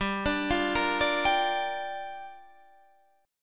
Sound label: G Minor 7th